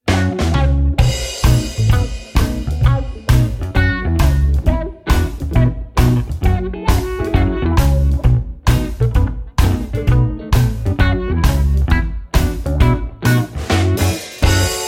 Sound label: instrumental